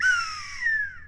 Tone Drop Fast, slide whistle

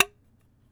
Small Crushed Can - Flick Tab; Micro Pack

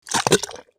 mud

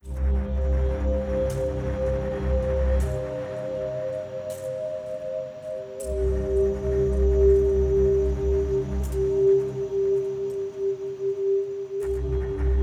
Pads, Spooky Times

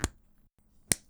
Thicc Tip Cap; bb - Books, Paper, Writing

